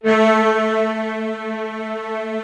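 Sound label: string, orchestral strings; Greytsounds VOL-1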